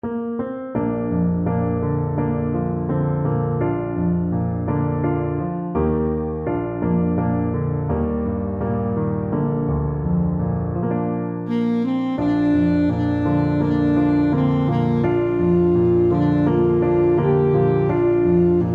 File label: ASAX, saxophone